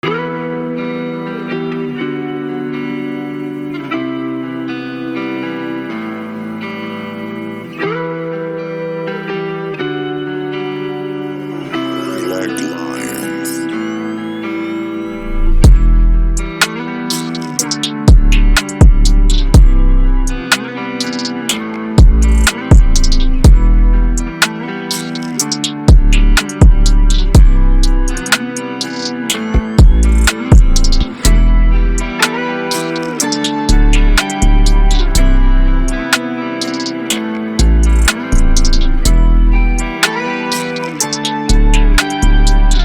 Melodic Trap Freestyle Type Beat Guitar Hard Rap Instru; Hard Rap Instrumental